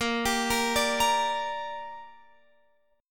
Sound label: Bb 6th Bb, Guitar Open F; arpeggiated; x,17,19,17,17,17